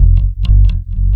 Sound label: YOKO BASS; Big Beat Samples & More